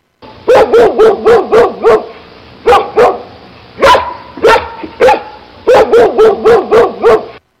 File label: barking ringtone